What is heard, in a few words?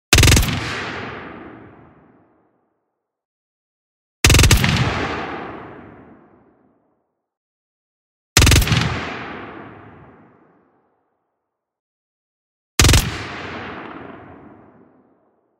LMG MG 42 Punch Burst; B00M